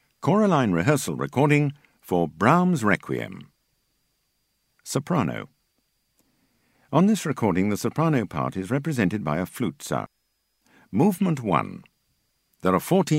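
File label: Req Soprano; Brahms Req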